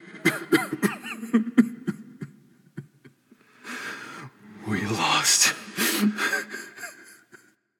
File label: boo hoo; smite